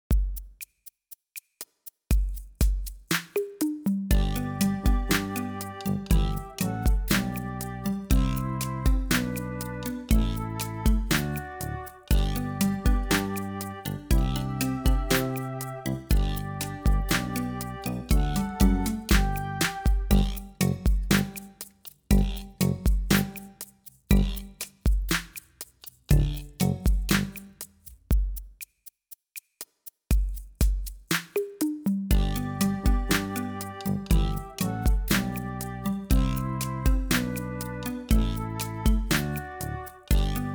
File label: Instrumental